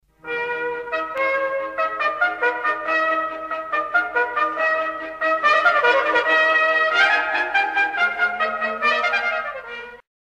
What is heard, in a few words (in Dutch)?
trompet; Welk instrument hoor je